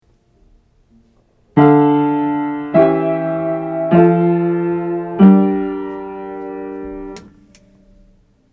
Part Writing First Inversion Triads Aural Training exercise